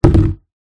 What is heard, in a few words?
household mop bucket plastic empty down on hard floor